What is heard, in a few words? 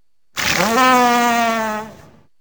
walrus; arctic; arctic life